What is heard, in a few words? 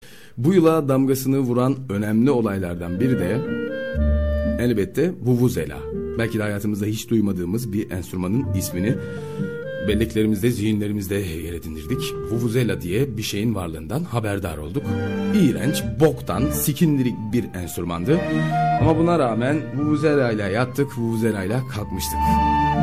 vuvuzela